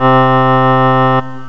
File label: reed organ